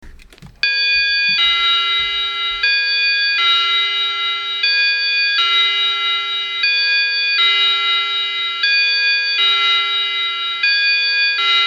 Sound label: Bim Bam Chime